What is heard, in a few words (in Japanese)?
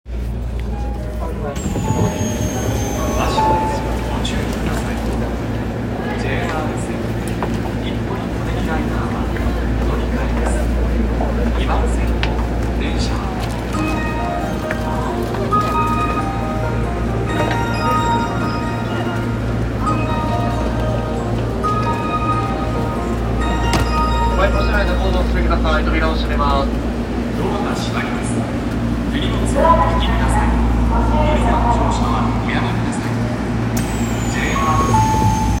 千代田線西日暮里東